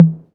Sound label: deep tome drum sample e key, Royality free tom single hit tuned to the E note. Loudest frequency: 196Hz